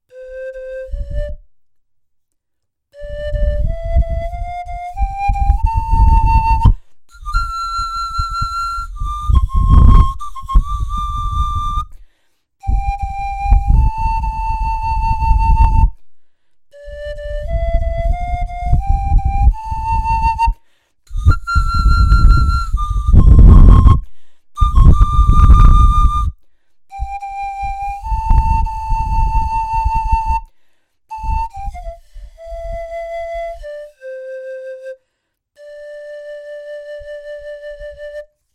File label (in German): pan klein melodie